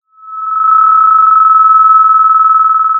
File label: Sound of a phone ringing